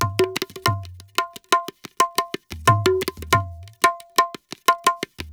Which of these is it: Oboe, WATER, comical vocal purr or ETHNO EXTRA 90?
ETHNO EXTRA 90